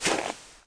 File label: pl snow light, player; cstrike